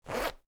jeans zipper, cloth

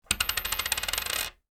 spinning coin

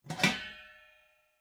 pot lid lift, kitchen

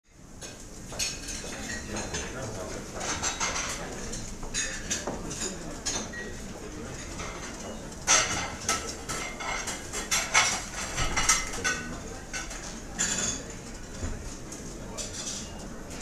restaurant, ambient

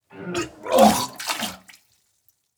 vomiting; human

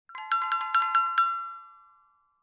bell ringing

